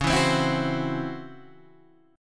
C sharp Minor Major 7th Double Flat 5th Guitar Standard; C#mM7bb5; strummed; JGuitar